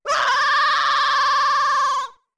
Fall; Zora Link's screams while falling

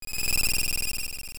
Move; Twinrova [combined] moving around; Oracle

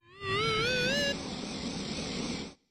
Bubble Blow; Blowing a magic bubble

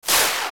Spike Die; zelda; OOT

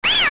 Hit; Bari; zelda; OOT

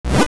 Text Open; Dialogue box appears (also used on pause menus)

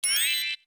Gleam; Tael gleaming

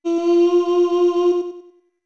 Voice F long; OOT

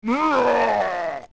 Die; Iron Knuckle; zelda; OOT

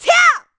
Power Attack; Link power-attacking (sword-spin, jump-slash, etc.); Zelda

